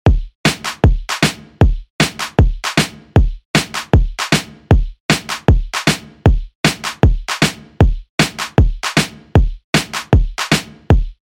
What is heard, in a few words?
Funk Redrum 155Bpm